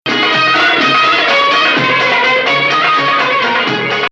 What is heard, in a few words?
Rast, maqam